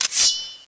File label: knife deploy; CSL knife